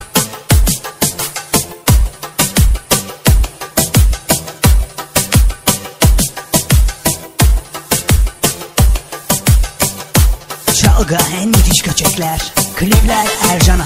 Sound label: arabic ringtone